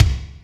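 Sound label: kick Blaster, Alesis D4; 300 Drum Machines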